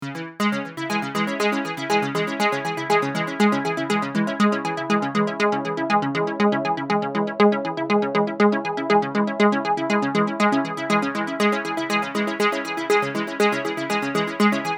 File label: 2600 ARP; MobileMusic No1